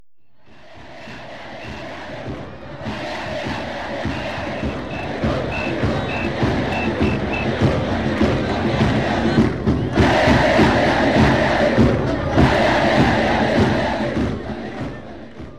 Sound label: crowd